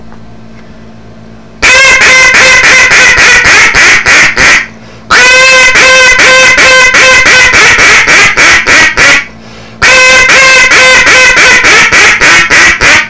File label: Duck man call